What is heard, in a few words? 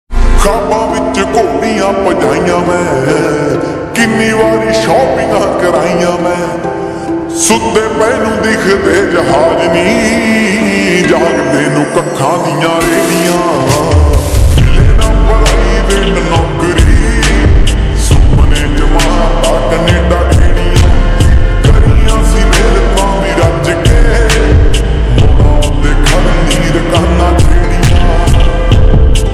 slowed reverb punjabi ringtone